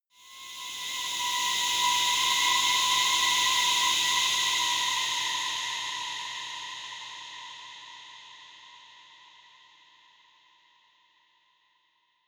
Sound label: erratic cry high, drones